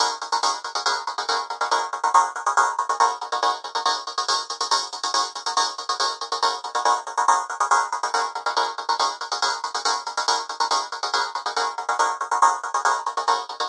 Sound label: optimistic highpass synth stutter, synth and electronic loops